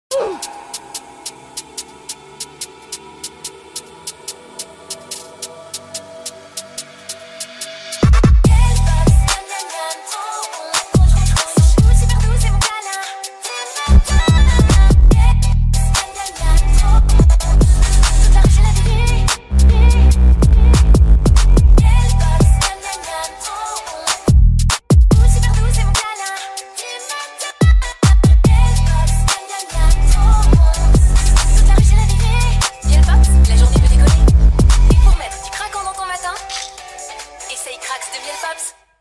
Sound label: Drill Remix